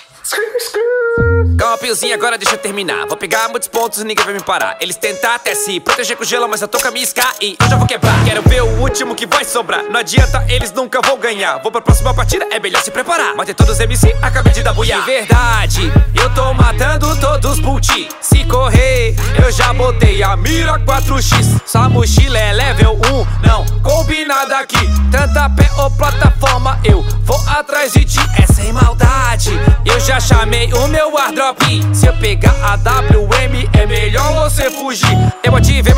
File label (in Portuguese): RAP; FREE FIRE